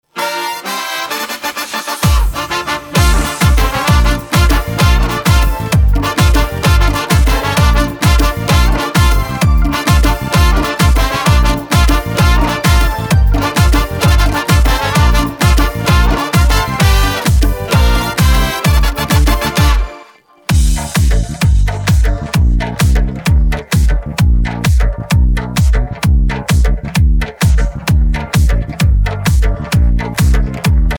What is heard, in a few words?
instrumental; persian